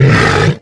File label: Ogre Grunt; timez attack